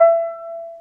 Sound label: Piano